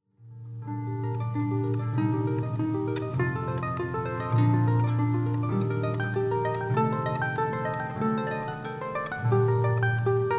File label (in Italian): ARPA